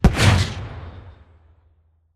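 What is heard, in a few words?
drone death explosion distant; Titanfall 2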